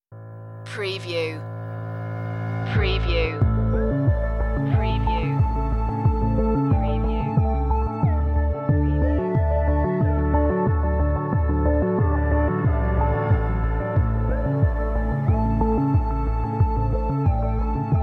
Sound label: corporate sooth